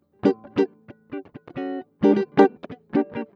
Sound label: 135 NuJazz Rhythm Guitar Bbmaj, Jazz Guitar Loops; Pro Tools Essential Loops; Digidesign